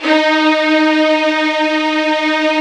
D#3 violin; VIOLIN1

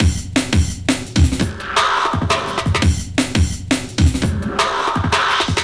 Hammer Break, Drum & Bass, Beats & Breaks

